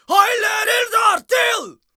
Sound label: Battle Cry, Viking Vocal, Un-Processed; Viking Vocal Builder; Battle Cries & Phrases